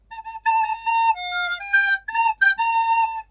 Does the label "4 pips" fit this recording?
No